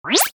Maximise, Effect To Accompany Maximizing Of Window Or Program; Maximize; Transitions; Multimedia